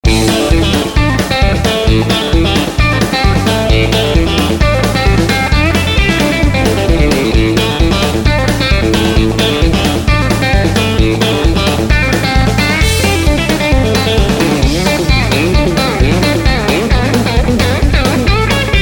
Cluster Pluck Up Tempo Country Picking Instrmental